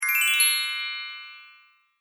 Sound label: Pantomime Fairy Appears; Cartoon Sound Design Sound Effects